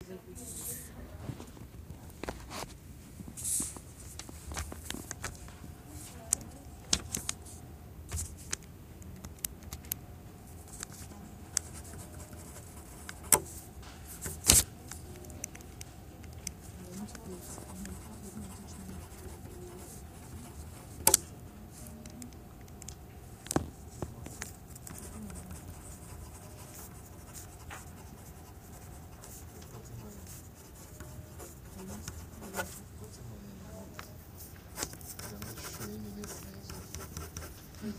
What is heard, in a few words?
writing